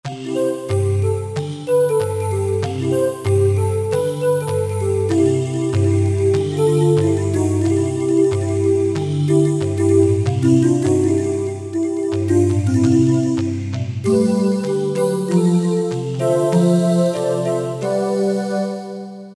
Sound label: Marim Vox; Generalmusic Equinox